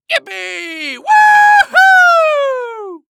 Human Male Yell In Celebration

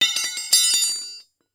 Horror Scary Pipe Metal Solid Dropping On Cement

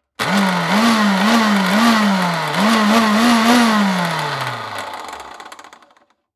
Household Blender Pulse

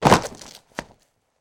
Impact Body Fall On Cobblestone

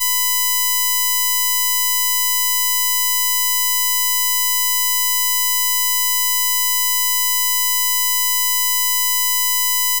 impulse chain 1000hz

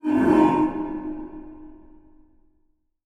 Production Element Title Transition Demonic

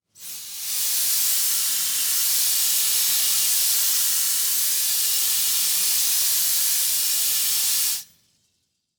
Industry Tool Air Blow Through Tube

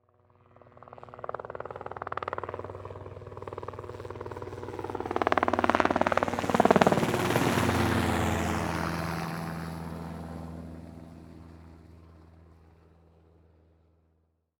Transportation Helicopter Fly By From A Distance

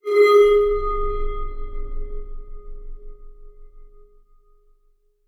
Title Transition Bell; Production Element Title Transition

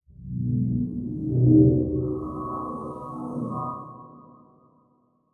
Transition Sweep Drone; Production Element; Title Transition; AdobeCC

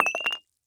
Household Glass Knock Over And Spill Water

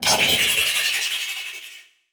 Transition Laser Warble; Production Element

